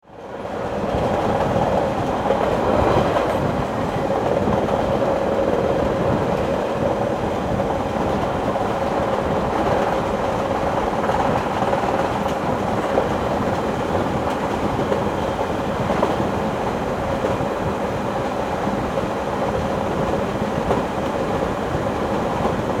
Train Interior

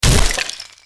Bullet Impact Wood

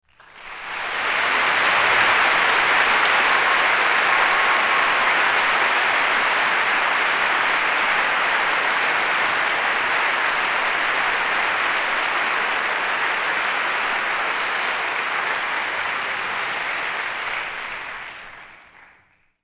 Crowd Applause

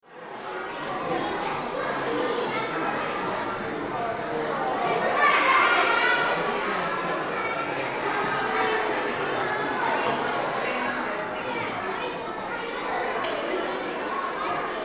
School Gym

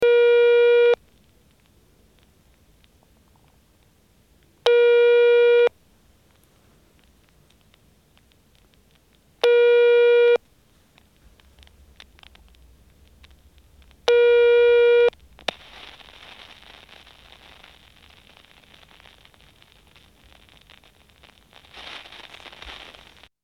Telephone

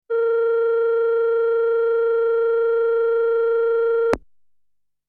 Telephone

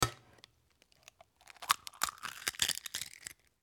Egg

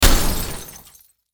Auto Crash Metal

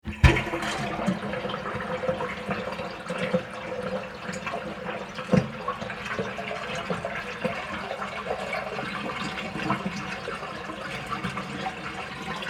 Sink Drain

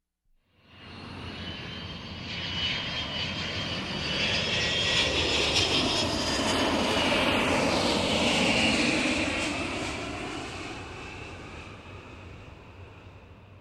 Airplane Jet Flyby Overhead Right To Left

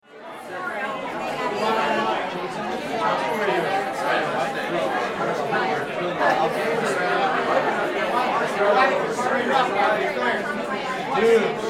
Crowd Walla Party

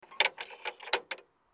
Telephone

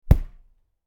Impact Hit

